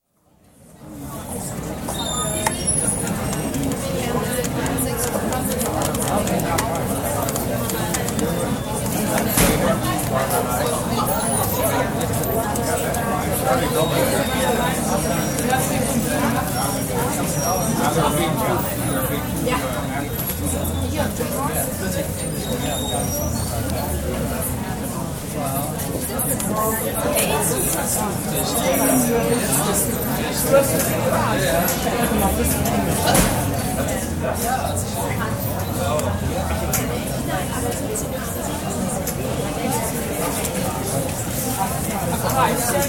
New York: JFK International Airport, terminal atmosphere; Airport Sound Effects; BBC